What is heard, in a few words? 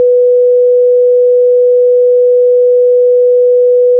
nota SI 493.9Hz; INSTRUMENTOS MUSICAIS